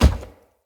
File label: Heavy Grunt Boot Walk Default, Footsteps; Enemy; Sinecure